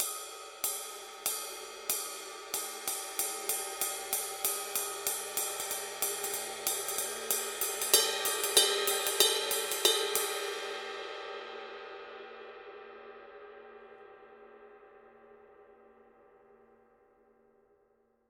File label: paiste signature dark energy 20 dark energy ride mark ii pattern